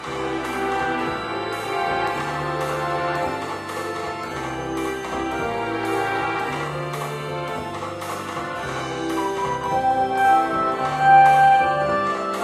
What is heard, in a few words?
Police Station Muzak; Postal